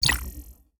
ui collect coin

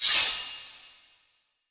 katana flash

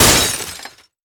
window break

